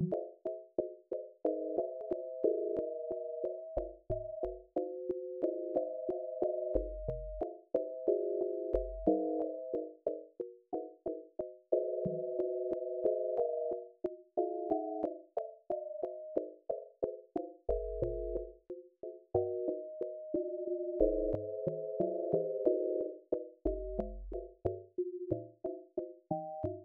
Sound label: text to sound synth; osp print party